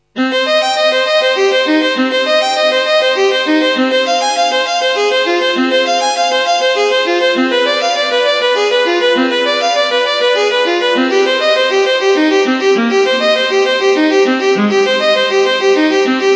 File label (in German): praeludium nach bach c moll